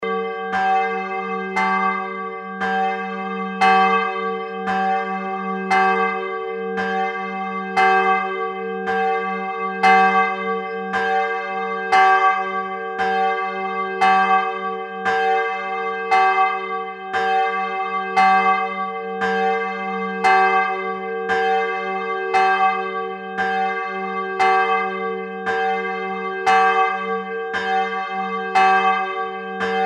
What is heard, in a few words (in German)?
Glocke